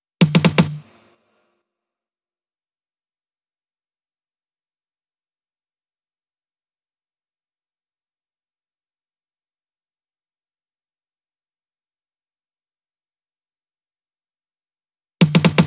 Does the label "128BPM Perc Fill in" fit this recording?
Yes